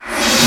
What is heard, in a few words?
Sweeps Short